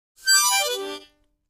Mouth-organ, Glissade, Type 9 - Descending; woodwinds

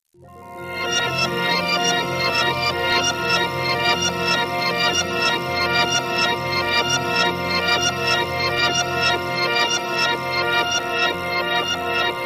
Music Bed Bizarre, pads

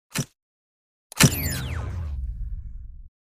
TV computer Power Down

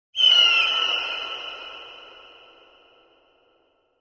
Hawk Eerie Call 4; animals

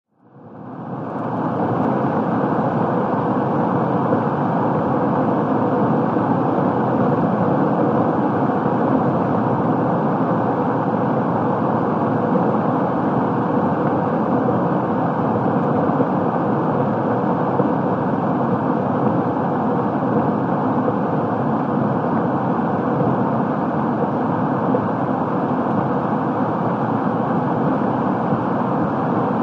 Sm Car Drive 55 65 Mph, compact

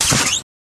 Future Gun Shot Sing, futuristic; Future Gun Shot Single

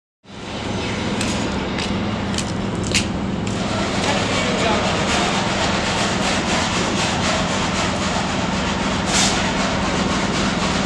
Factory Atmos Sawing, factory

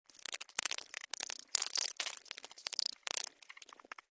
Insect Mvmt Vocal, beetles

